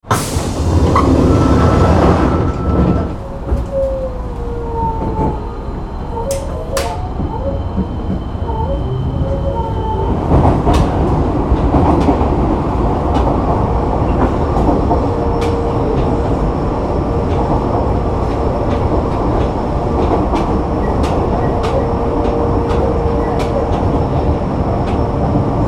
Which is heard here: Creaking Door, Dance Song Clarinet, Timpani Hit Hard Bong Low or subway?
subway